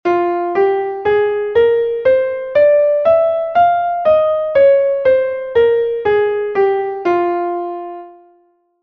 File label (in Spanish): fa menor melodica